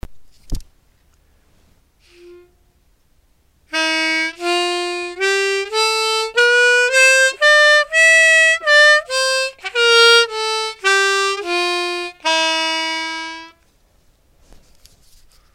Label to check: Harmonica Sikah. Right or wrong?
Right